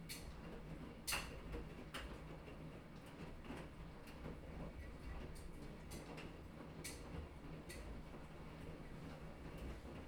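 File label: soundscape laundry room; Harvey Mudd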